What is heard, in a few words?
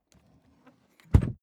suitcase close case